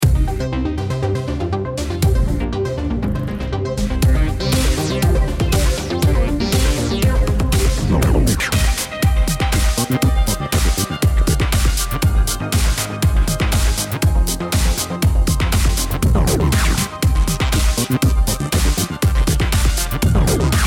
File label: start round